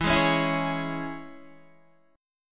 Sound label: Db Minor